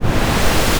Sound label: riser